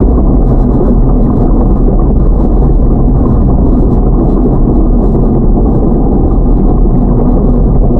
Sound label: maelstrom bact big, ambience